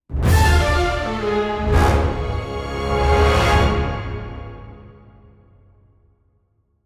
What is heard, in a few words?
Transition